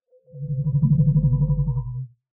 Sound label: Alien Creature Sound